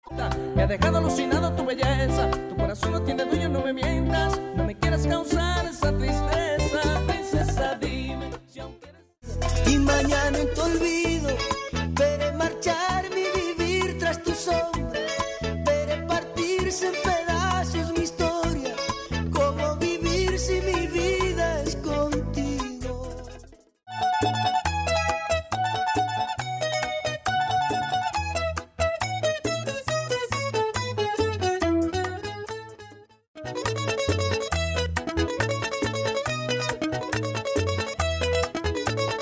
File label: bachata bongo timing